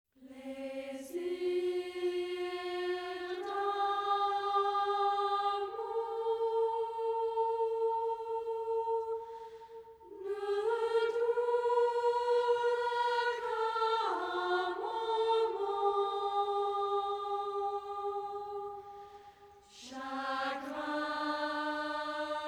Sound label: Instrumental